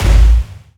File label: Heavy Laser Blaster Hit